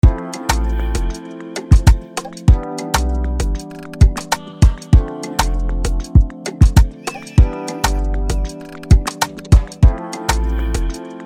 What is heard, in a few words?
BEAT